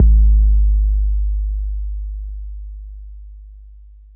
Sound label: BD 808 Deep